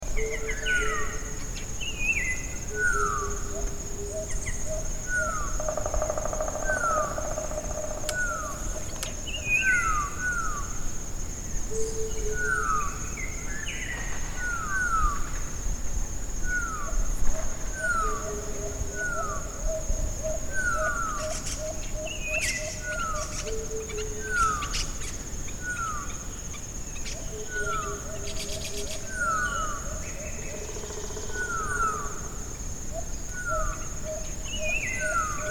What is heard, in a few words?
Subic Morning Chorus